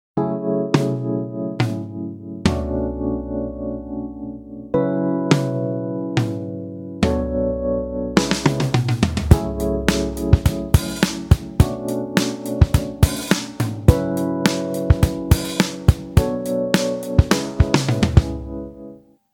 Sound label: drum tomtom